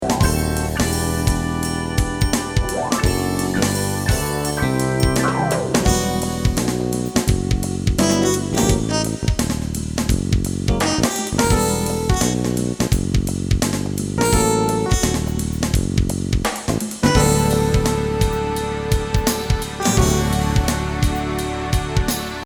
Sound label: Jazz Rock Fusion